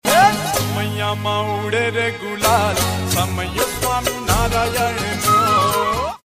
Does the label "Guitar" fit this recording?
No